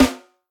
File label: Full Acoustic Snare Sample C Key, acoustic snare sound tuned to the C note